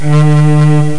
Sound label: crazy song violin